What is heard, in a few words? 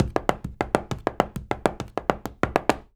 133 STEPS, Spanish And Gypsy